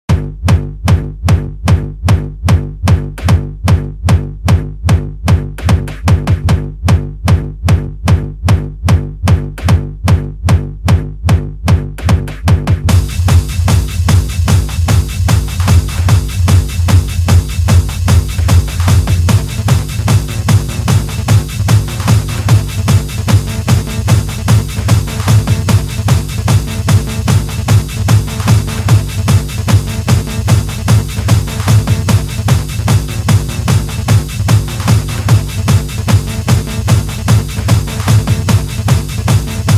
hardstyle

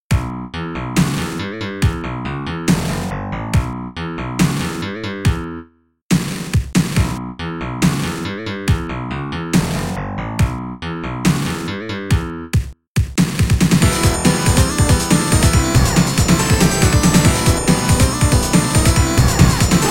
raging waves

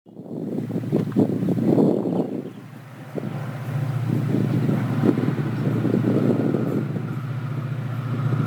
train approaching

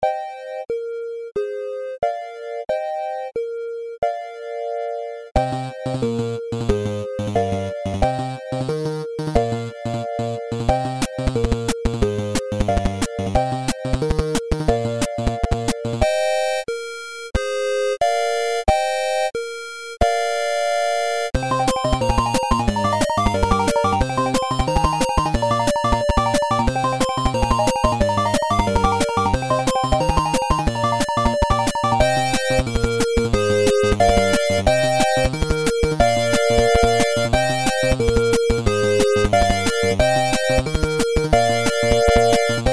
8 bit mix

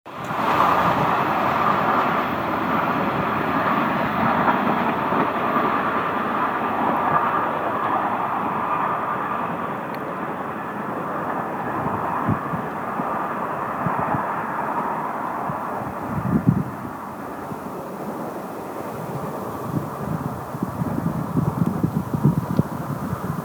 train departing

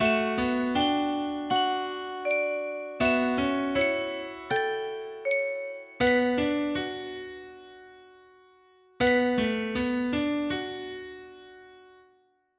game over

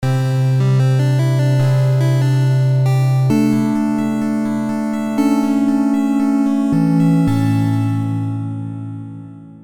final boss clear

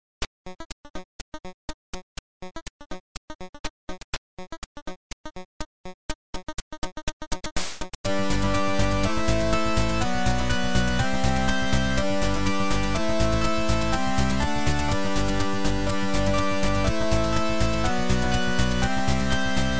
8 bit mix

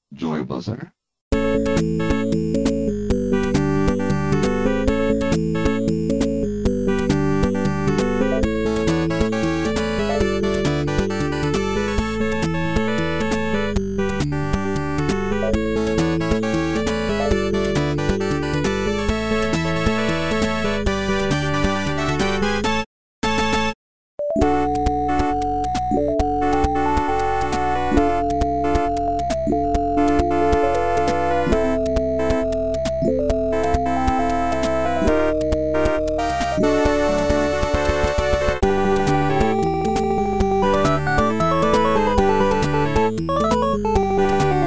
8 bit mix

